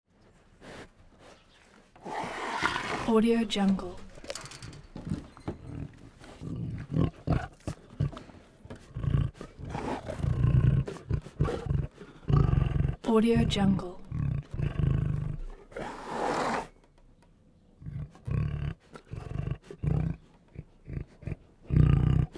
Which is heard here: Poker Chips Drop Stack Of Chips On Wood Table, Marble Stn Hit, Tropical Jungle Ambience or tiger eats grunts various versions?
tiger eats grunts various versions